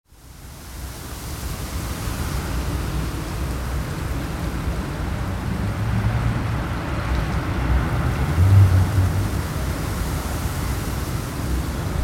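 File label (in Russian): городской ветер смешанный звук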